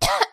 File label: female cough; Voice; Neurotrauma; Barotrauma